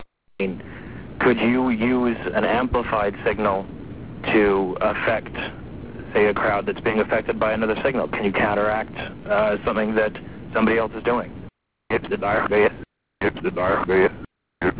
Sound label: harp